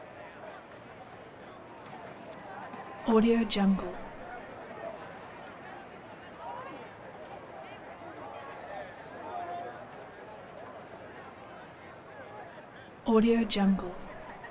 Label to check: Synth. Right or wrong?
Wrong